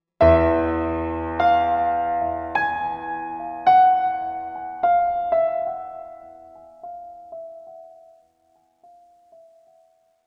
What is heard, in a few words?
One shot Piano; Zombie Army 4 Dead War